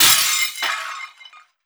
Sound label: glass breaking; Cartoon - film effects